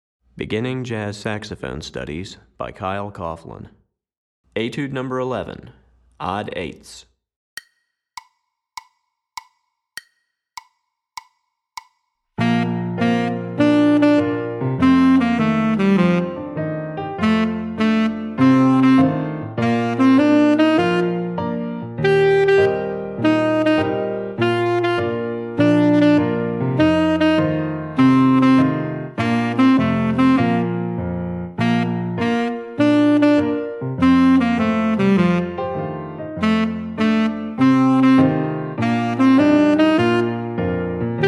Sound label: Tenor Etude, Saxophone; Etude #11; Beginning Jazz Saxophone Studies for Tenor, Volume 2